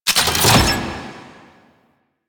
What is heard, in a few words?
Omni Tool Equip; Warframe; Vehicles; NechroMech; Abilities